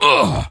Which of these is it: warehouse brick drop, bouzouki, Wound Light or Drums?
Wound Light